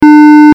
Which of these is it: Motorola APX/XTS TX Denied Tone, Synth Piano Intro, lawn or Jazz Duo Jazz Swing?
Motorola APX/XTS TX Denied Tone